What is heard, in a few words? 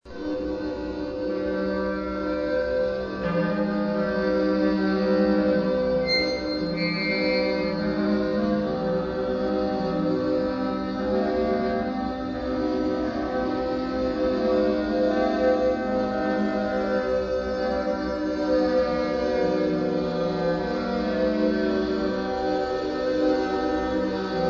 dirge